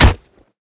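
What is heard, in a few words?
kick on a wooden board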